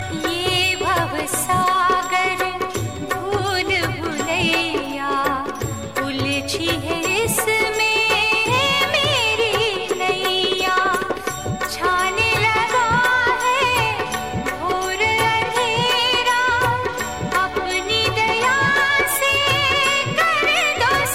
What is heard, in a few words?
krishna bhajan, ringtone